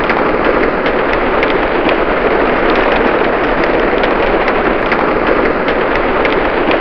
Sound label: Inside Rain Loop